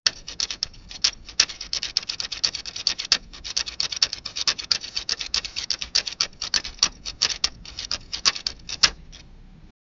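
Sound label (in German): stempel auf einem blatt p, paper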